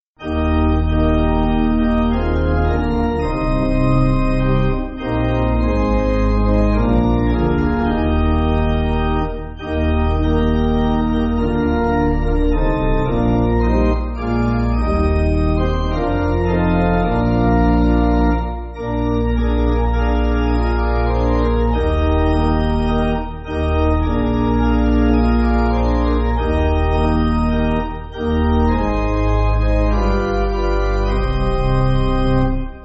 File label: Pipe, church music